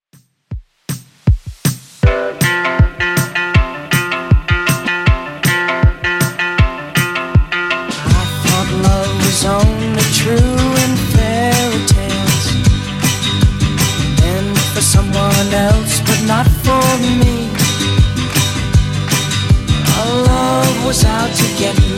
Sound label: 70s Redrum 158bpm